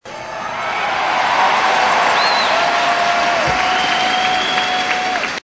applause

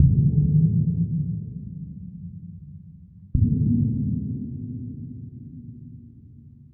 steel sheet, Rumbles

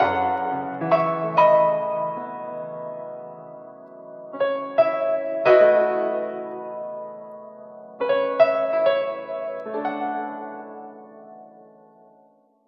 piano, Loops Sad

